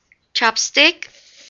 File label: chopstick